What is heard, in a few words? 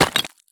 sprint tile; Escape from Tarkov